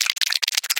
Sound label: mrat eat; STALKER; customnpcs